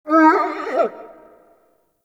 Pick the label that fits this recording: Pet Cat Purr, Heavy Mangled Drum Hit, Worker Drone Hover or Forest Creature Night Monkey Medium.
Forest Creature Night Monkey Medium